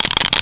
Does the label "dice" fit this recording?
Yes